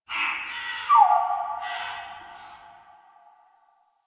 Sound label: Bird; Warframe; Quests; TheNewWar; Ambience; Drifter Camp; Birds